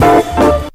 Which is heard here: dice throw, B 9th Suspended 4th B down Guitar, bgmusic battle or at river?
dice throw